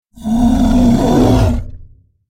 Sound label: Ferocious bear roar